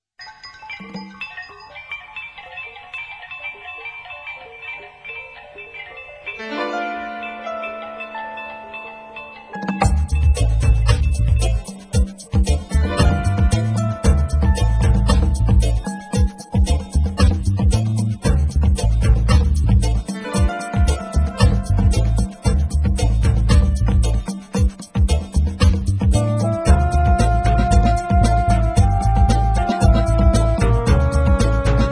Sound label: eastern dub